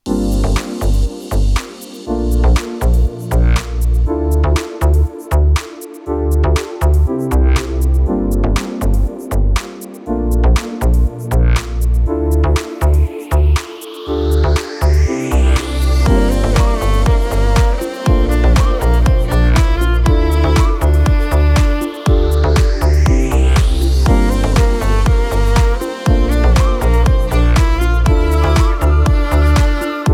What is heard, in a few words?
deep house strings solo; by burak sound bank; deep house vol 5